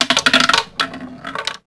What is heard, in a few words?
roulette stop; Max Payne